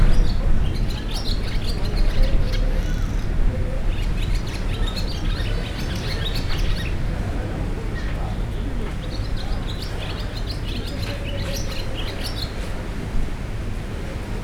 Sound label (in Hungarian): parti fecske, aranypart, tengerparti hangulat; thassos